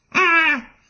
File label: ostrich hurt; Indev+ 0.3 Portable